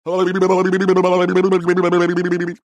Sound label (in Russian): zvuk triaski golovoi